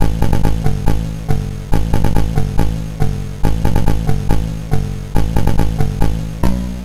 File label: Apple II Bass, Chiptune; 8-Bit; Sonic Foundry; Sony Creative Software